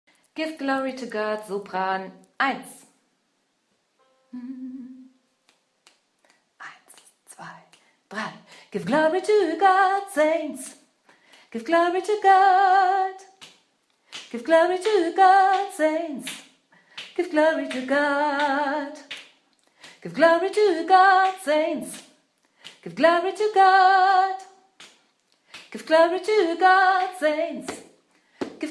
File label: Give Glory To God Sopran, gospelchor